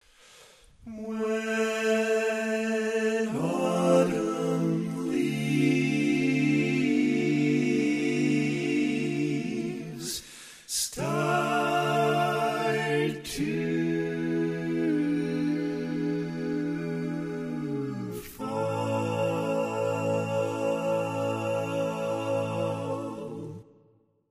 All Parts, Barbershop Tags, Key change on final chord; Short version; Each recording below is stereo - one part on one side, the other parts on the other side.